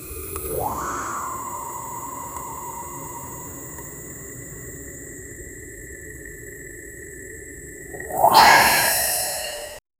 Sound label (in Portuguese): som suave de gs subindo; gas